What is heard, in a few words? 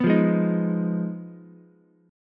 F Minor 7th Flat 5th F down Ukulele; Fm7b5 strummed; JGuitar